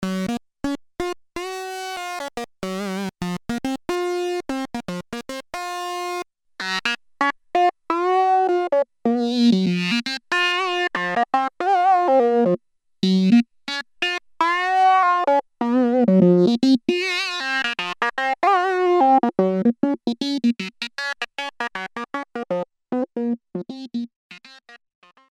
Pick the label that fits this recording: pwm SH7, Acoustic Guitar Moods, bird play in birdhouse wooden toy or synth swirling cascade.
synth swirling cascade